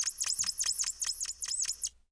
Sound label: Marker Pencil Pen Squeak; Ed Edd n Eddy; Adromulus Pack; EEnE